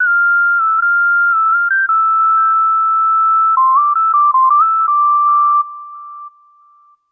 piercing pretty high melody; SYNTH AND ELECTRONIC

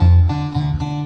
offcenter akai bassline

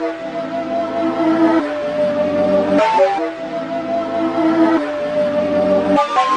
150 thick spooky flute; SAMPLED MUSIC LOOPS

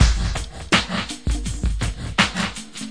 082 bpm rev echo slow funk, DRUM LOOPS and BREAKS

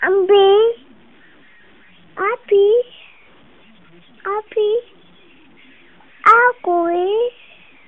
weird baby talk

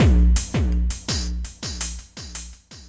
hardcore slow delay beat, 083 bpm, drum loop

